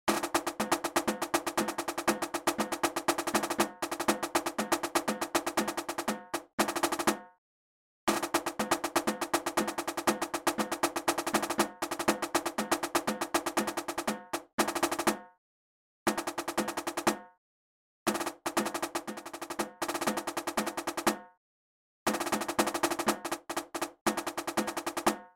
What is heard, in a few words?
moving drums